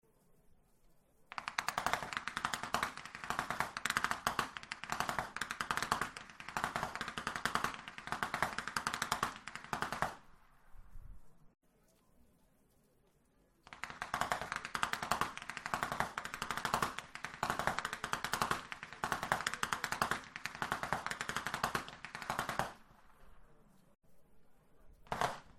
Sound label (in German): schnalzen; saaldorf